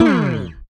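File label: SB SLIDE, SPECTOR BASS; Northstar - New Gold VOL-1; SPEC.FUNKBAS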